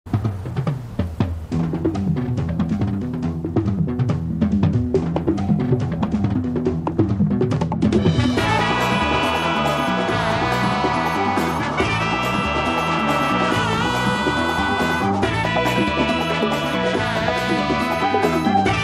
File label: theme, bgm ringtone, malayalam bgm; malaikottai vaaliban